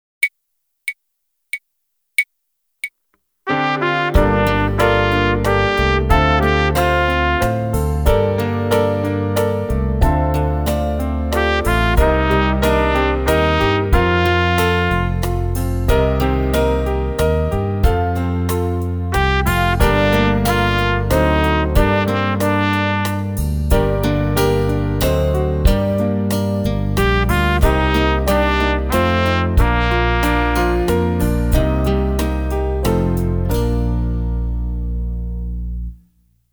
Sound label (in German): Echo Ubung